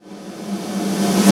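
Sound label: reverse snare, left; East West - Phat & Phunky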